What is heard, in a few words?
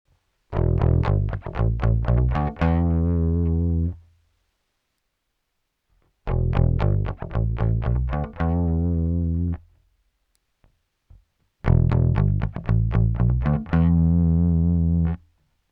Walrus Audio Badwater Clean EQ Drive 50 Blend 50 HF MF LF